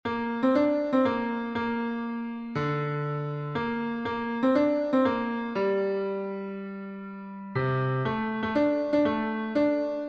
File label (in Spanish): tenor, himnos en piano; 95 EL CIEGO BARTIMEO; cantos del camino